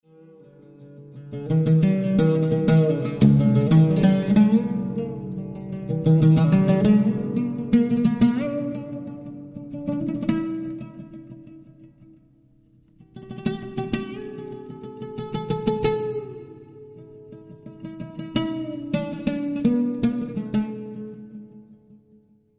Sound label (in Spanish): oud